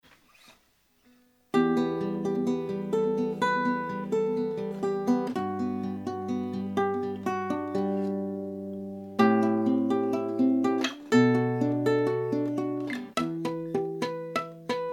Royer dBooster2 bypass TritonAudio FATHEAD 27dB Ibanez Nylon 60cm